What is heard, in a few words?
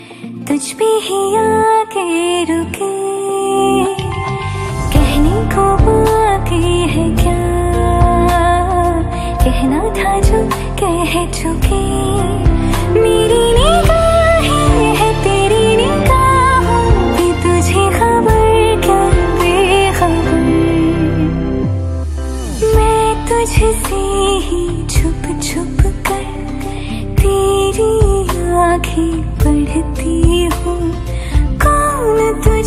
Romantic Hindi Song Ringtone